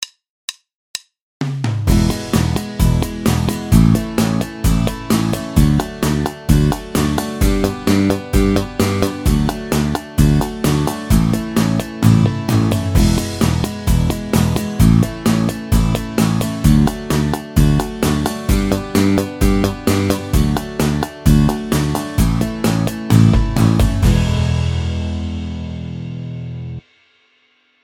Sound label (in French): main droite exercice, basse; exercices